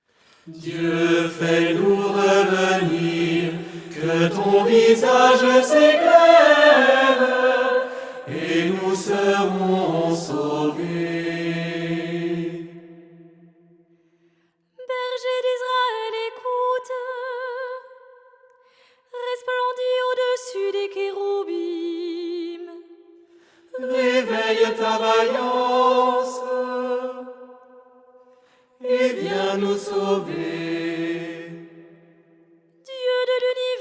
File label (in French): Psaume 79; de Gouzes